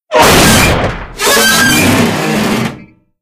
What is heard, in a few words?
crash sweetener; video games; source engine; portal2